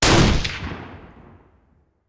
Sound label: Rifle; Sound Ideas; Guns